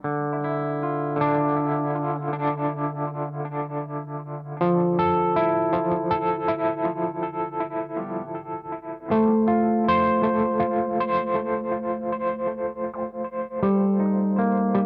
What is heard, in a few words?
Strymon Timeline Tremolo